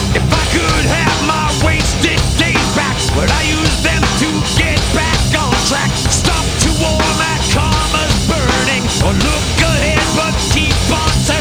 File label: frantic, ambience